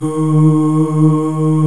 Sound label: D#3 male